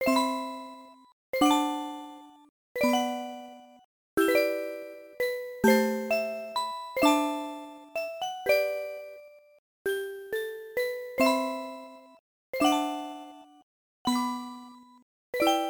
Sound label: Music Lullaby; SM64DS